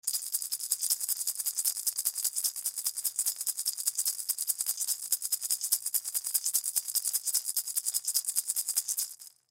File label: Maraca Plastic, Maraca Plastic Small Shake Multiple; Shakers; Maraca Plastic Small Shake Multiple Fast; Blastwave